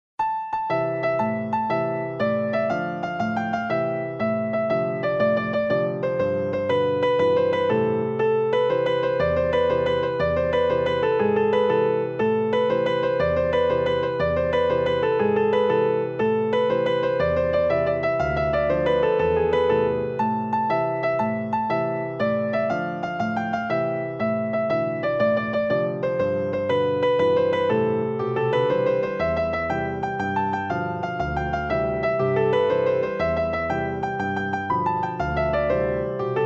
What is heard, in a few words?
tarantella piano